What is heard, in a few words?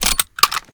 kilo98 reload load; gmod; viper